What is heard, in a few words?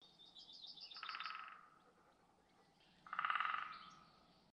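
Woodpecker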